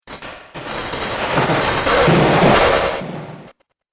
Droid Out Of Order, robot; Robot Sound Effects

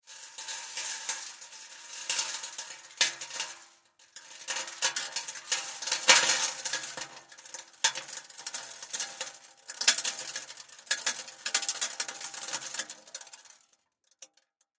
Impact Debris Drop Metal Surface; Sound Effects:Materials; Impact Debris Drop Metal Surface Long; Blastwave

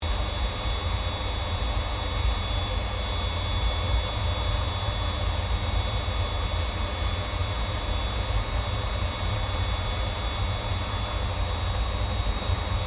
AIRCRAFT CA-27 SABRE IDLE INTERIOR; CA-27/F-86 Sabre; Airplanes; Vehicles

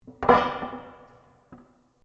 METAL ON METAL LARGE IMPACT; Metal (Sound Effects:Materials)

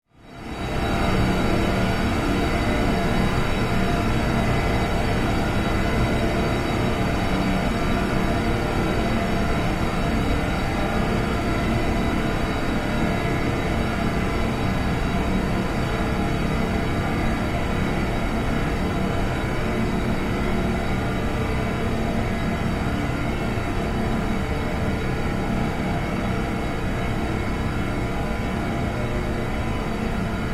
Created Drone, Drone Sound Effects; Various sweeps, pulses and drones; Sound Design

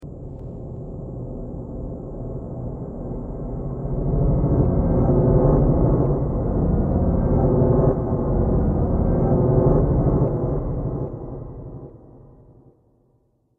Horror Dark Piano, Piano Sound Effects; musical

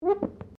GLASS SQUEAK FINGER; Material components for constructing more complex sounds; Materials; Foundation

